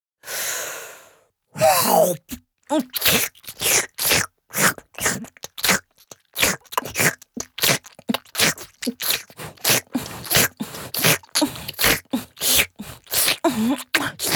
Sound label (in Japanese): チュパ音, ASMR; フェラチオ(中)：頭を上下に振って陰茎をしゃぶる